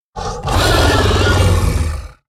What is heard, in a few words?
Wailing Demon Play